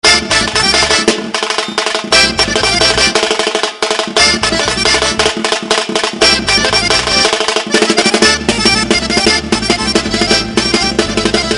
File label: lezginka